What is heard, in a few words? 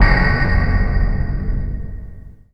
bronce hit; Best Service Dream Experience; FILM-TOOLS